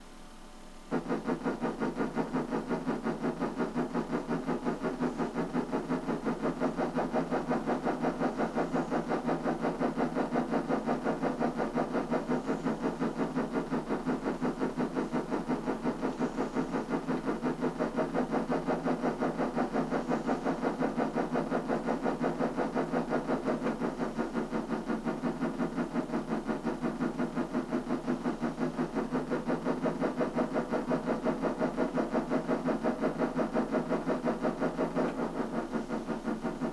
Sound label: Ambience; Ambience for April